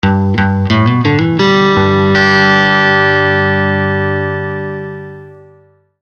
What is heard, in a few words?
Countryside; PlusFourSix; Sania; Lars Edvall